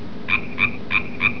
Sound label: hyla